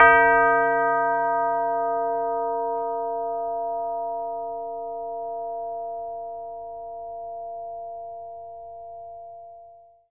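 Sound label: CHIME C4; ILIO; Synclavier Percussion 2; World & Orchestral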